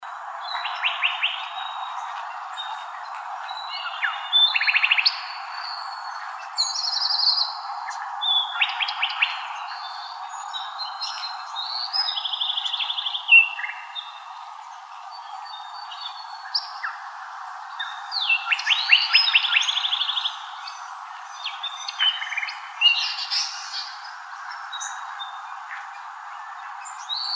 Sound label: Wrens Kinglets Gnatcatchers; deviche